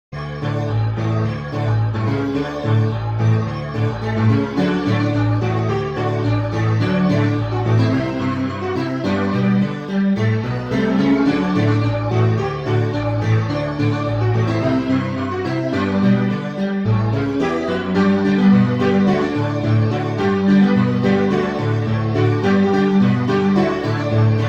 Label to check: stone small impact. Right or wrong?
Wrong